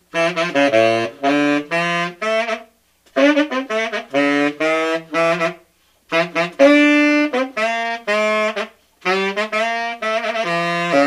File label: Keilwerth King Temp Tenor